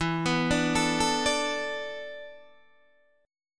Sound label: E 7th Suspended 4th, Guitar, DADGAD